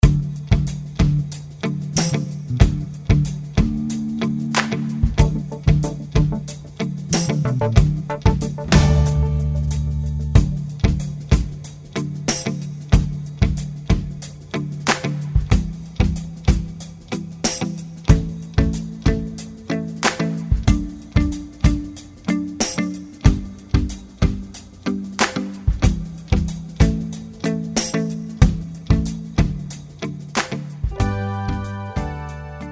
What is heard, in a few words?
Contemporary Track